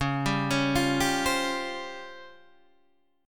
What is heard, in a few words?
C sharp Major 7th Flat 5th Guitar Open D